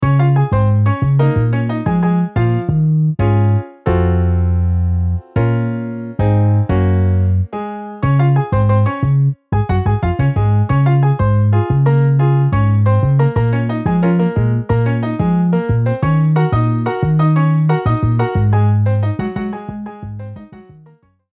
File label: Piano Bass MIDI